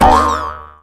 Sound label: launch, water balloon; Birthday Party Bash; Wii